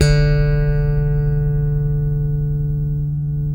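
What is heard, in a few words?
6-String Funk, Bass; Spectrasonics; Bass Legends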